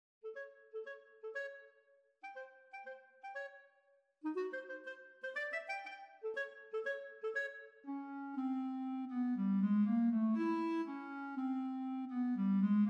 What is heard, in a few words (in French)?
scherzando pour clarinette; Sauter, Louis